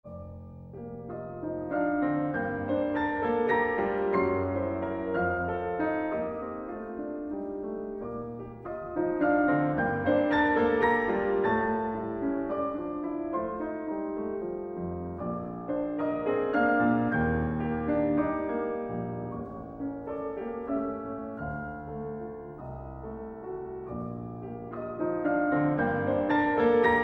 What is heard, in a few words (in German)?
Variationen b moll